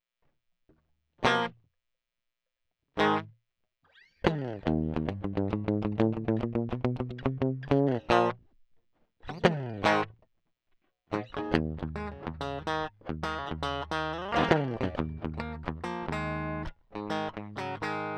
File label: Crunchy Blues